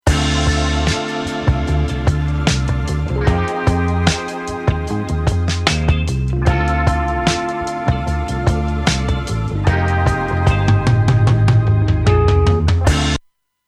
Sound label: SPL Vitalizer MK3